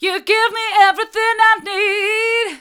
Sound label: hard, vocal